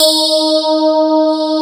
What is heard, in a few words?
sparkles